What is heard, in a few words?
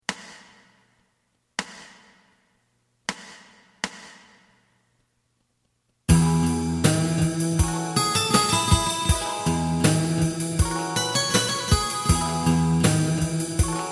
bass